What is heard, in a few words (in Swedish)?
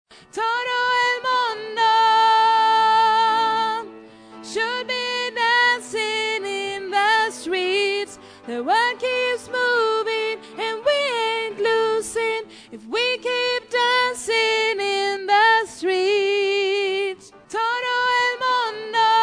todo el mundo tenor, stämmor